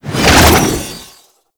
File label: Archwing Prime Sword Whoosh; Warframe